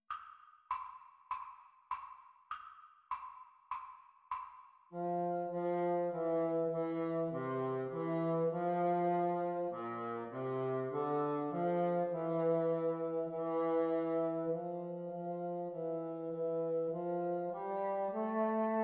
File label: Bassoon Duets; exam speed